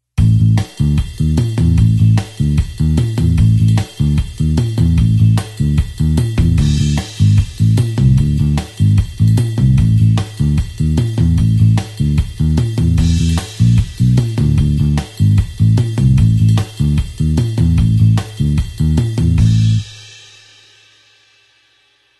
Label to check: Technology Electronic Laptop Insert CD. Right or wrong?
Wrong